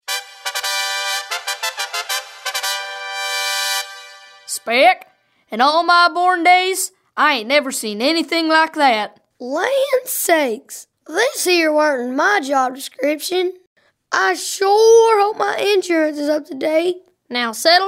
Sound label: Fanfare; Scene 7 w Shepherds; christmas